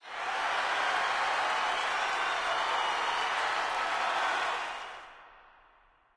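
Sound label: movement lobby victory crowd; Smite; Cabrakan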